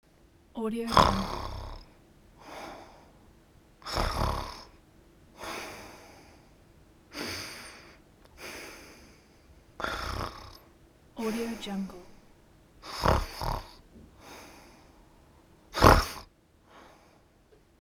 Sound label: snoring woman